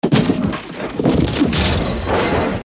destruction body impact

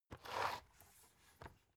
alu case small handle

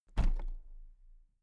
toolbox place upright soft

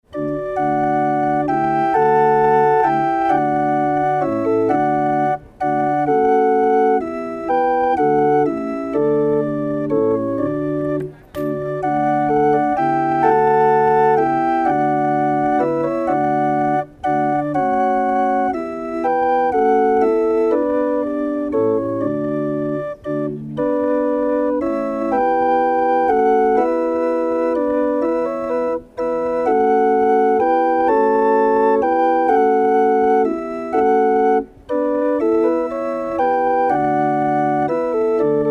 hurdy-gurdy

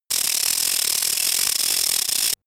ratchet bright

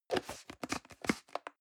plastic can small lid screw close hard

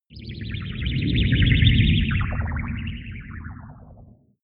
whoosh storm twitter weird

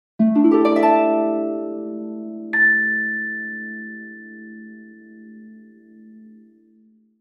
harp glissando and bell

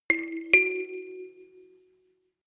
notification kalimba rattle second up

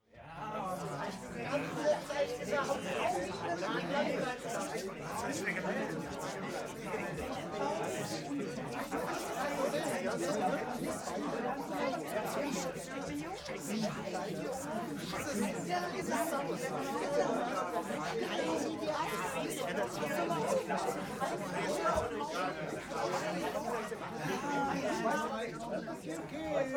male crowd grumbling

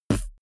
beatbox bass drum

